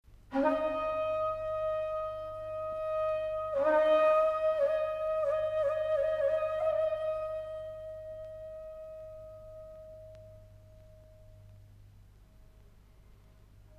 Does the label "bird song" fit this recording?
No